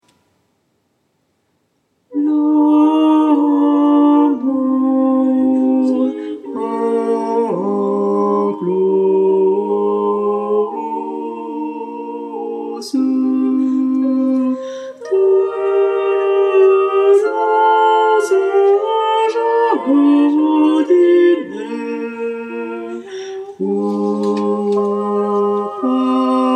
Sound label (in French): basses; L'amour de moy